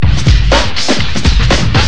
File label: FILTER, Big Beat Drumloops; FILTERS; AKAI